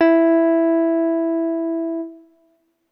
deep E3

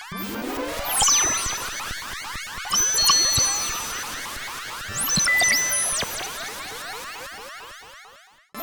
FM warble

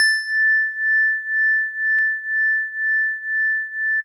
House Organ DX100 A6

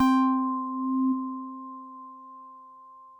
SYNTH; Medival Synzzz

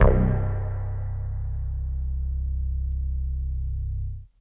CS5 Square Verb Vinyl Synths C0, bass

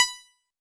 Old Banjo DX100 B5

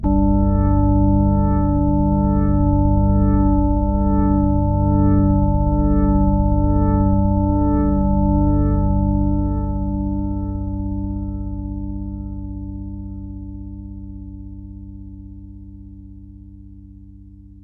PAD Organ; Professor Malbadors

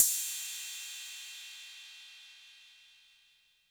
Cymbal 808 Color

